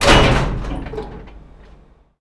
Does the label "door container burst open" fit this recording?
Yes